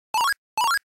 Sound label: jump